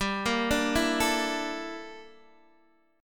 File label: G Minor 6th Add 9th G down Guitar DADGAD